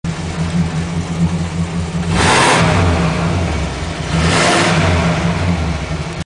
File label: v8 oldsmobile